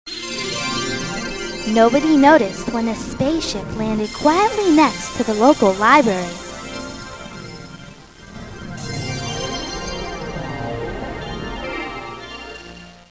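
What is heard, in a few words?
space ship; Easy Phonics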